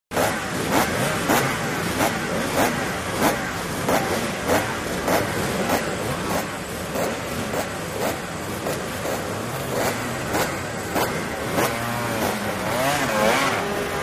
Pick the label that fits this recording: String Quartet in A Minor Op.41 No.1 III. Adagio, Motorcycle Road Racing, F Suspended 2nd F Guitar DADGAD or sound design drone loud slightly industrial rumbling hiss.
Motorcycle Road Racing